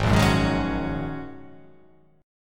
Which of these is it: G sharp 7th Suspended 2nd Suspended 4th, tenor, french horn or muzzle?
G sharp 7th Suspended 2nd Suspended 4th